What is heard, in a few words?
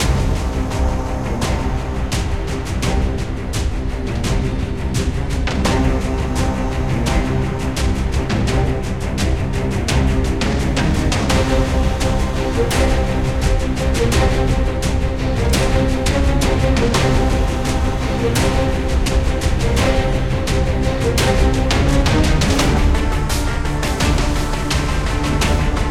Combat Music; XCOM Enemy Within